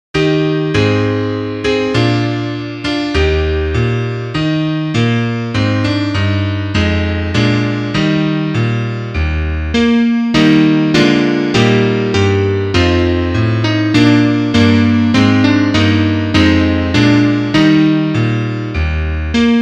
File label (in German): Klavier Solo, trompete; Nun will der Lenz uns grüßen